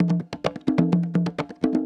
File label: Percussion Loops, Bongo & Conga; Houseworx